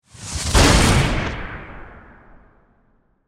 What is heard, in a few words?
Magic Spell Fire, Whoosh, Impact; Horror; Explode; Magic (Sound Effects)